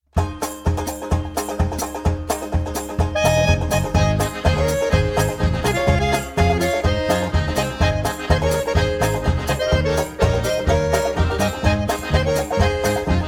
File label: speed rag